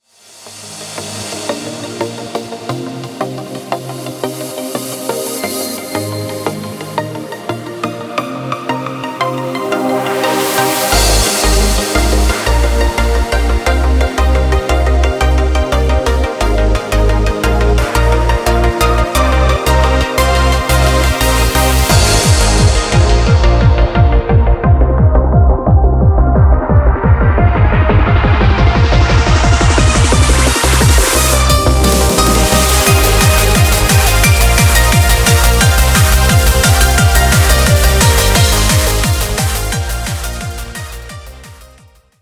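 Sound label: background music crossfade; Invaxion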